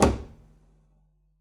pizz noise body slap low, double bass; dsmolken; noises

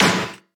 sn generic

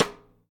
Side Stick Soft, hydrogen drum samples

